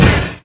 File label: incinerator fire